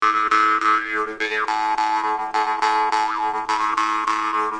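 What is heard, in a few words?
jaw harp rhythm, 105 bpm; WORLD LOOPS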